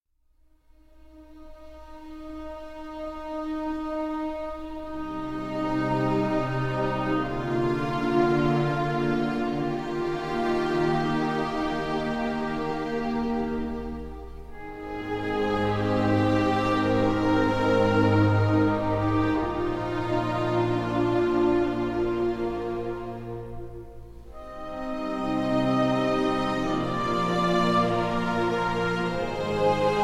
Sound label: Oboe and Strings; From 'The Pacific'